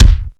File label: rich high end kick drum single hit a sharp key